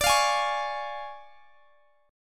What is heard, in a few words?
D Minor Major 7th Flat 5th D, guitar; strummed